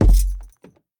Footsteps Wood Walk; The Town

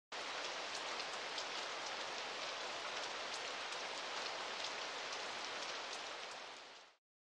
Night Ambience; pc 2015; five nights at wario s origins

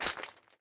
glass broken footstep; adams venture origins

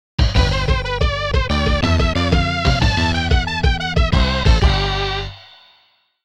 Goal In (Not Clear); Taito Retro Game Music Collection 4; Riding Hero Cluster

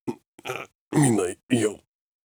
in Pain

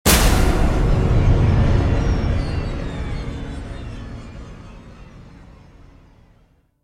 Logo Sound

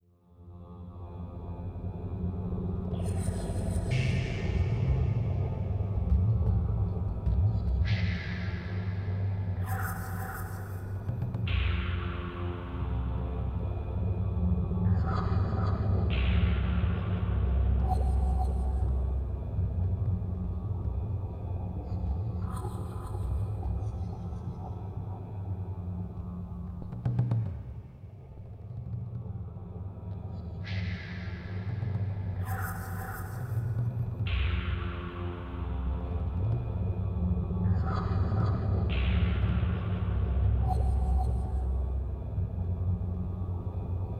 Lava World ambience; Lode Runner On-Line The Mad Monks' Revenge

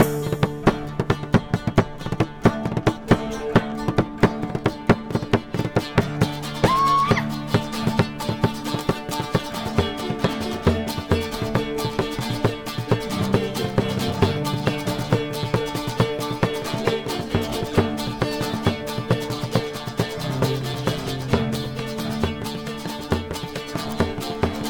tavern; The Witcher 3 Wild Hunt; Novigrad

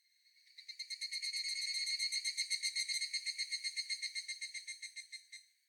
Ambience Cicada; Devour; The Inn

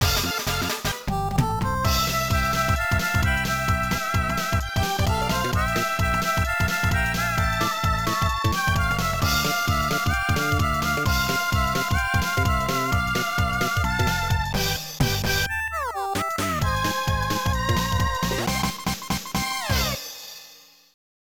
Ending Theme (Instrumental); Aero Fighters 2; Neo Geo; arcade

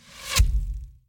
Ranger Hail Of Arrows Impact

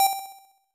Mouse Click; 1997 snes; SM Choukyoushi Hitomi Bangai Hen 2 Maki no Love Love Panic

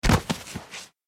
Player Faller

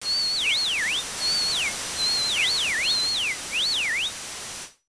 Crowd Cheering; arcade; Ending; Rock n Rage

